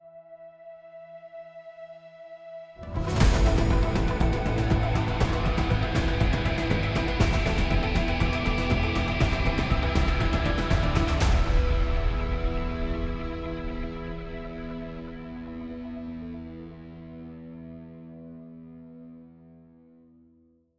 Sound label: Character Select, Solo Mode; apex legends season 12; Vantage